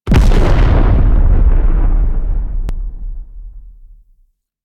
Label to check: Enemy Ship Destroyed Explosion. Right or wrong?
Right